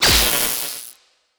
Electrical Burst; Audiosurf 2

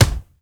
Punch General Body Impact

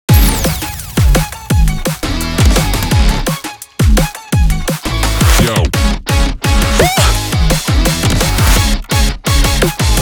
THUNDERBOLT(INST); Groove Coaster Future Performers

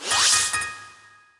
Music; Music Frontend

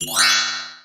Unlock Ui; Superbrawl; Nickelodeon Super Brawl Universe; Android

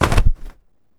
Cushion

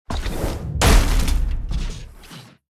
Steel Crush

